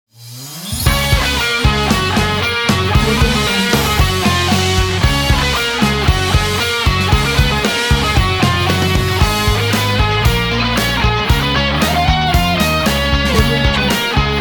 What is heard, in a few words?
christmas rock deck the halls ident; vernacolmusic